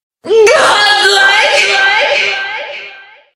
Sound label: music stinger; killstreak music